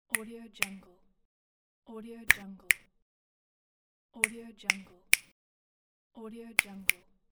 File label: finger snapping